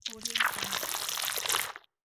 fleshy transformation

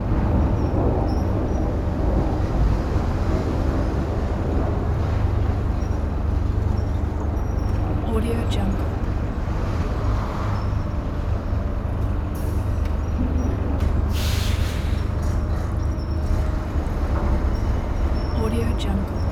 industrial ambient

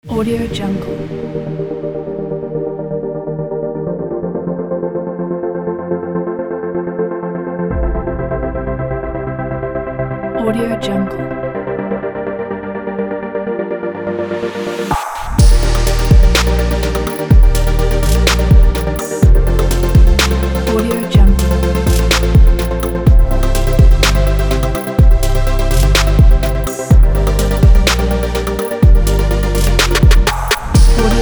chill trap

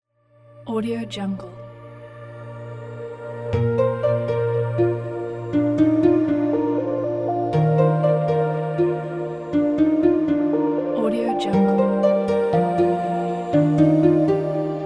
inspiring ambience

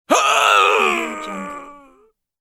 male death voice; applehillstudios

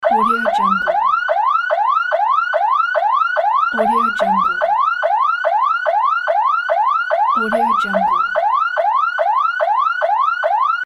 ambulance saw siren loop; luishpms

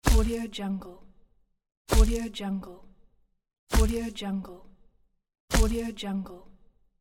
trolls steps on dry grass

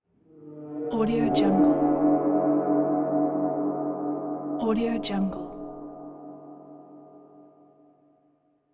horror gong

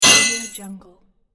hit metal

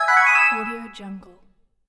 level win alert

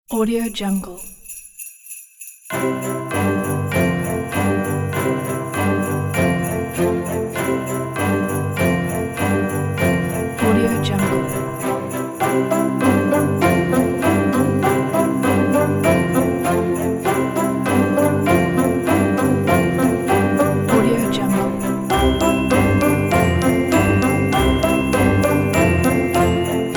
sleigh bells

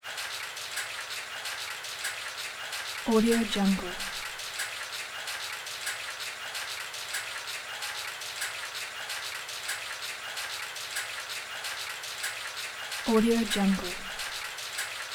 comic clock large busy machine ticks

